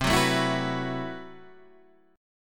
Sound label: A Minor 6th Add 9th C, Chord